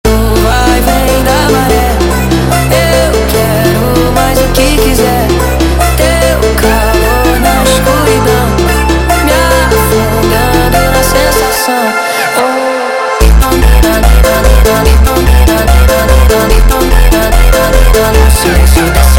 Montagem sped Up, funk

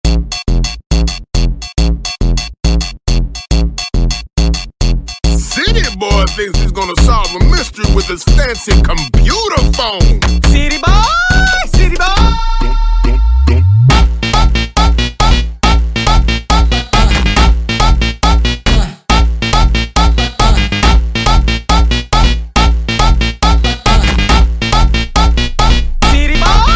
Jumpstyle sped Up, funk

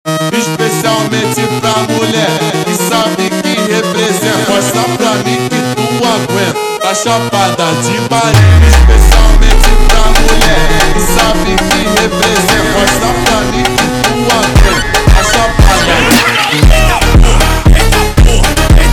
Funk slowed

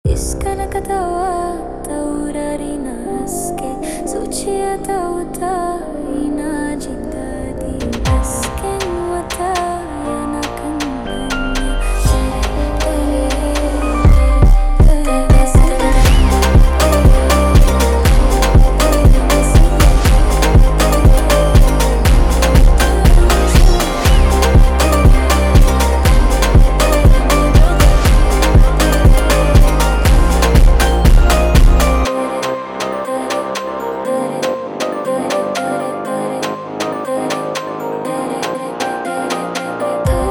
Funk

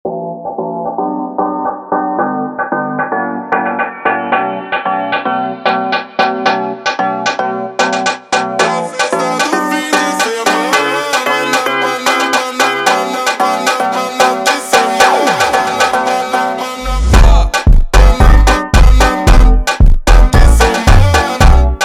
Funk super Slowed

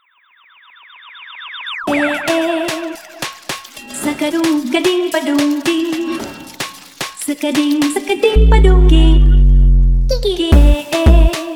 Automotivo

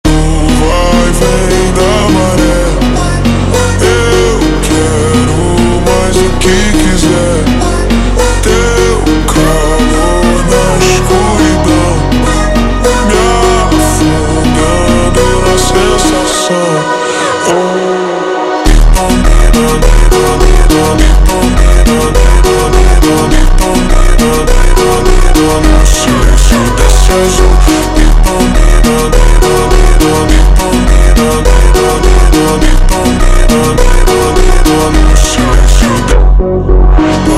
Montagem super Slowed, funk

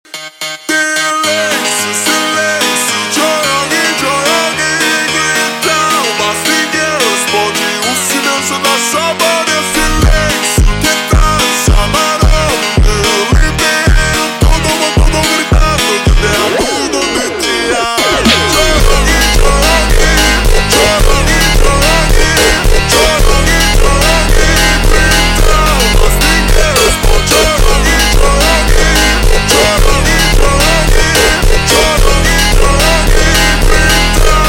Funk Super Slowed, funk

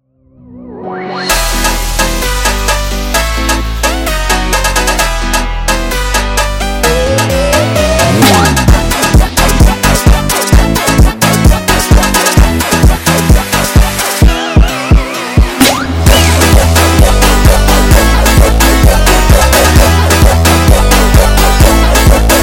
Montagem instrumental; فانک

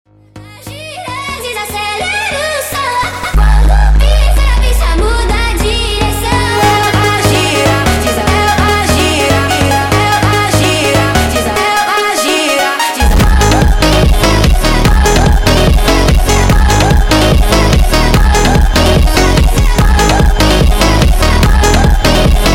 sped Up, funk

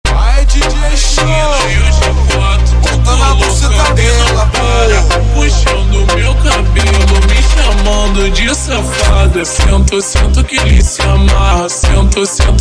slowed, funk

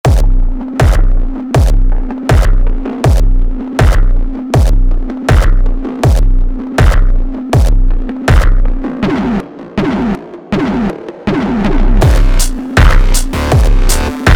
slowed, funk

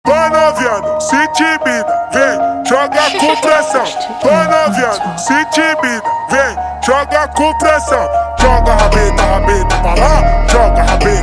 Funk super Slowed Reverb

